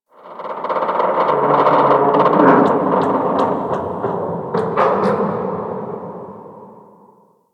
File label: Exterior Metal Debris Creaks; Props; Warframe; Railjack